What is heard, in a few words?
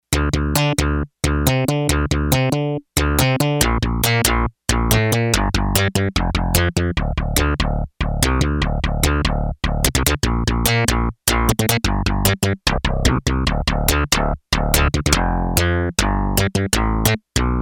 Super Bass, Yamaha TX802; Factory Patches; Voices